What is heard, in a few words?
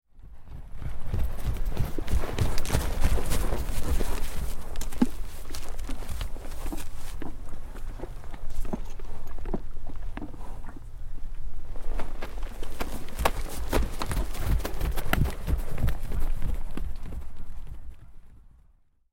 Single Horse Trots Up Stop; Historical; Hollywood Edge Historical Series; War Horses Armies & Weapons